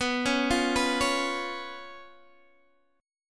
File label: B Suspended 2nd Flat 5th, Guitar, Open D